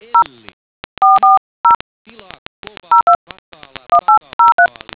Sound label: dtmf